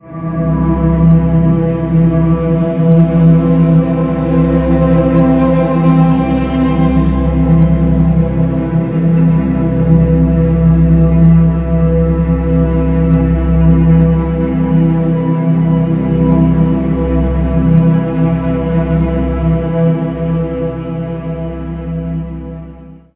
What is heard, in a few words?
ambient creepy ringtone